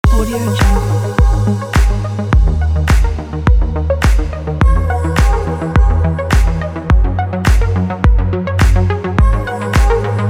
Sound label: progressive move kit